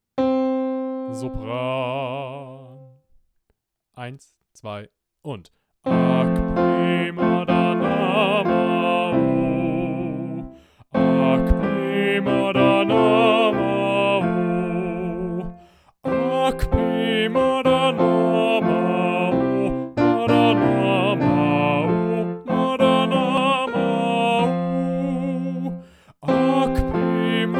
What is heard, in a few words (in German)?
Sopran mit Tutti Klavier; Akpemadana Mawu